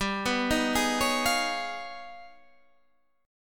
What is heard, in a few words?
G sharp Minor Major 13th G